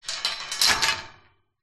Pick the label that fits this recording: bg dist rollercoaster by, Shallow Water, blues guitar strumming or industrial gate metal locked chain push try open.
industrial gate metal locked chain push try open